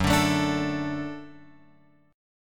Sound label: F sharp Minor Sharp 5th F sharp; F#m#5 Chord {2 5 X 2 3 5} strummed